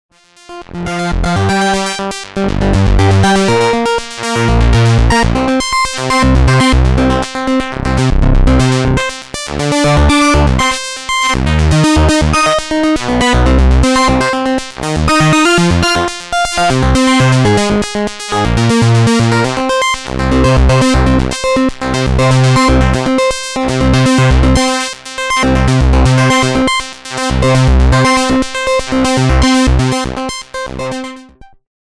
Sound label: waldorf pulse